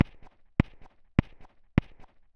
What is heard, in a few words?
contact perc